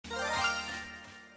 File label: shiny noise; legends arceus